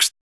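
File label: vox percussion, Vocal Planet Jazz FX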